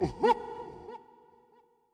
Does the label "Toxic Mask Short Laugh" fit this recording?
Yes